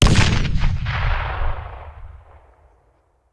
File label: Grenade Hand; Sound Ideas; Guns